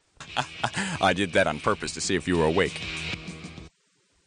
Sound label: laughs; Prophet